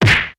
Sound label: wet face punch